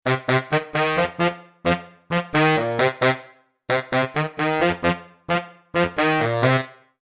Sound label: Bari Tenor Sec, Wind